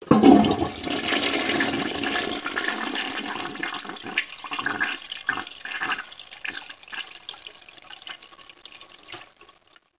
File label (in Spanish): DESCARGA, Car